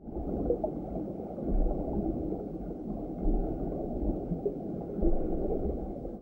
Volcano Bubble, ambience

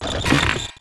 Tower WAR DIE; Lazhuil; Mob

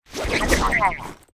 WAR ATTACK

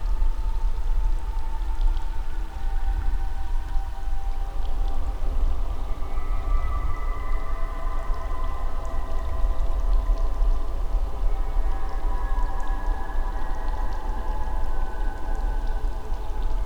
Altar, ambience

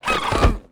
klan war die

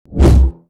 Beam Sword, Weapon

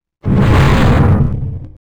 WAR IDLE; DAGAN; Mob

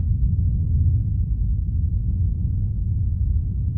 Volcano Mountains, ambience

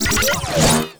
SWORD WAR ATTACK; Mob

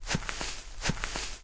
walk; Mob

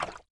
Water Run

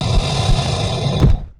WAR BEAST DIE

